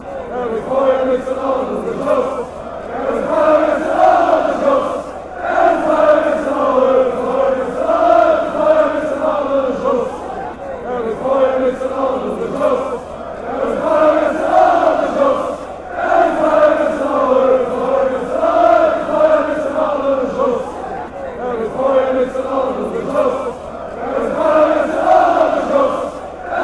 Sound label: beerschot chant